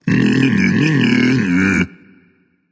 tongue, voice; Smite; ymir skin14

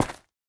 footstep; smite; apollo v4 skin14

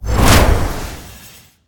pwr lift; smite; tiamat

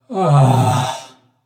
nv sigh; smite; xbalanque

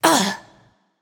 grunt jump; smite; voicepack; tiamat

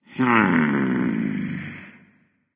thinking; smite; ahpuch

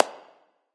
clap; smite; chronos

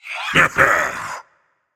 heh heh; smite; ravana

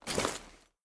pet armor; smite; lancelot

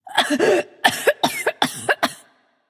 clear throat light; smite; voicepack; hel

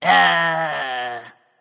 nv confused; cupid

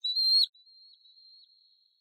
ambience good bird; smite; conquest

